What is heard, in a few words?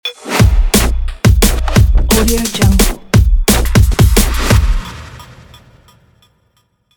competition logo; soundmatchaudio